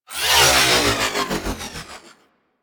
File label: Disc Shot Projectile; Disc Moa